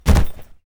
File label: suitcase drop